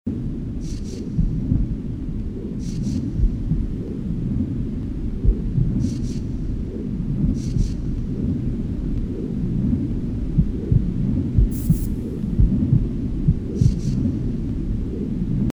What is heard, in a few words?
Womb